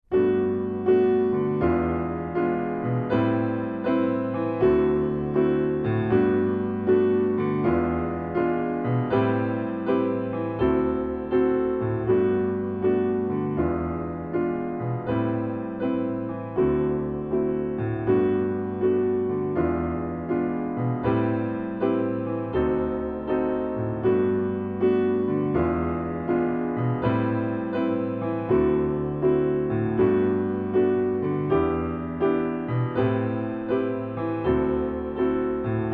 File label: piano; escargots